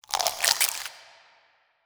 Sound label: small legs up down; Stellaris; biogenesis; ships